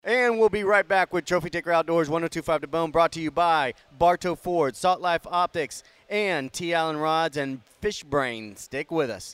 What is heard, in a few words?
pro bass open E